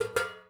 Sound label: ui drop metal thin hollow; Rust